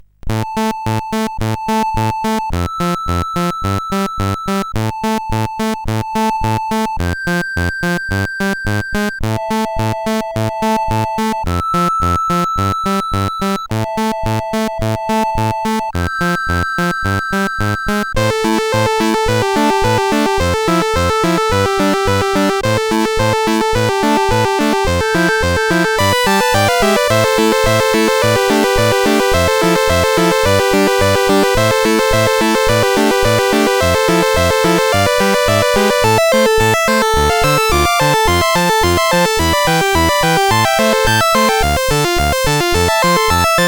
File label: Sound sid CSG8580R5